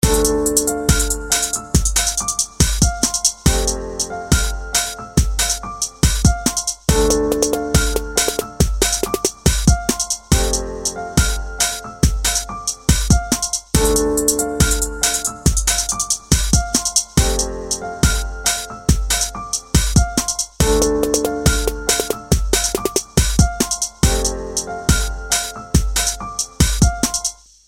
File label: Mellow Hip Hop Piano